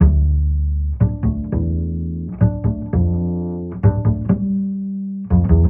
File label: bass, Loops